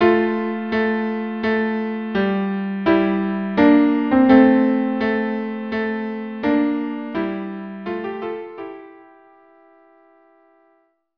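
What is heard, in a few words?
violin viola; Largo from Xerxes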